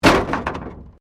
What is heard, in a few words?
Wreck Door Panel Clunk Metal; Wrecked Cars, impacts, crashes, scrapes; Objects